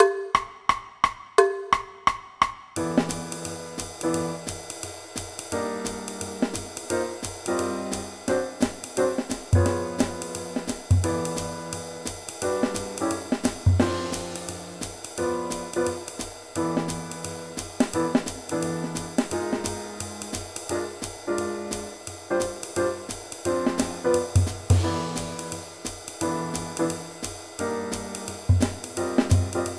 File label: BASS; Honour Bands; Jazz